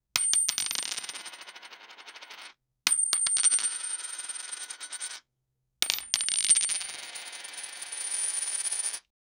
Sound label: a bunch a high pitch, coins